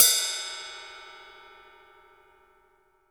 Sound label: 20 RIDE CUP